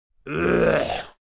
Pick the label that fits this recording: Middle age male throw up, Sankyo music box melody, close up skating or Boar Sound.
Middle age male throw up